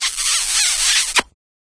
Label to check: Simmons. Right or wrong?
Wrong